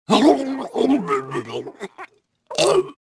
drown; kyle

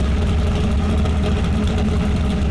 IDLE, Engine; Cars; XBOX

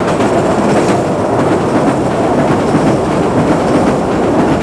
subway passby

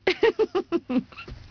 F happy; People

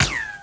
laser Bolt ric; impacts explos

